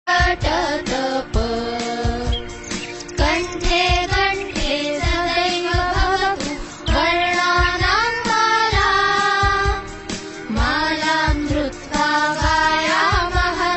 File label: sanskrit love ringtone